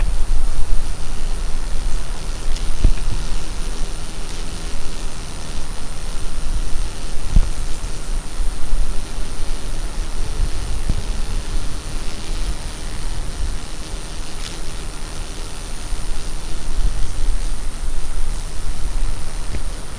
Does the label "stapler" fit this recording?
No